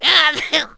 sneeze

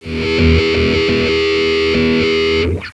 Wah Feed

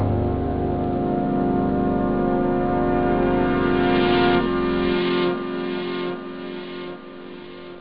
synth chords

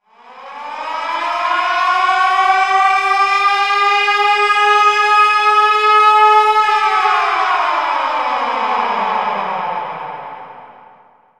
siren; willofsteel

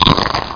Bite; Realmz 5.1.6

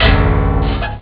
akord, guitarras

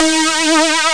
707 synth lead

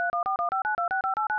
dialing; cuteorganizer

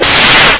ufo b

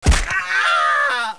Ouch; Close Combat

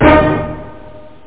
tutti

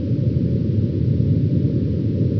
amb; CHASM

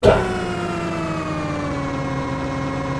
ship tail close; Earth and Beyond; EA Games

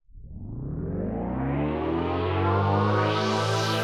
SYNTHPAD HOUSE 125 A; eJay; Sphere

